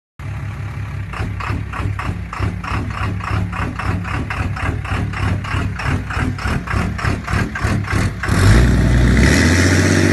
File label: loope sound; RaceME